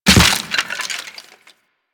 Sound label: Operator Land Soft Bone, Footsteps; Warframe